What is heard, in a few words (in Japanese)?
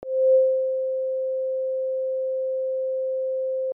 サスティンDS70